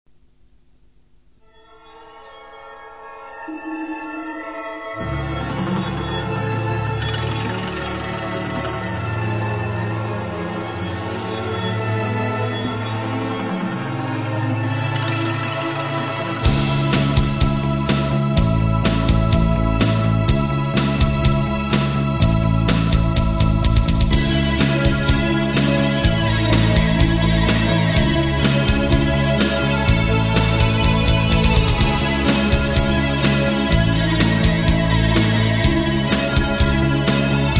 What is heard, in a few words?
impromptu mono